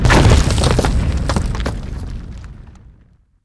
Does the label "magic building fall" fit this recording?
Yes